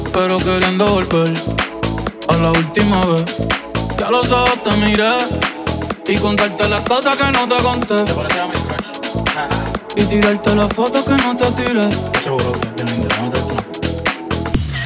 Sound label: Dtmf Latin Tech House